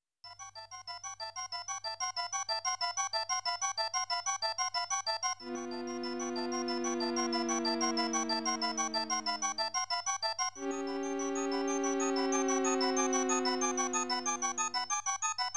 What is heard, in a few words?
Haunted House BGM; super mario world live